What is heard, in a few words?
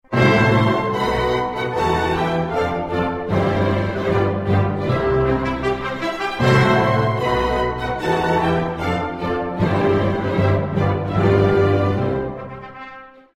wedding ringtone